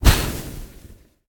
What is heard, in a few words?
sun impact; smite; khepri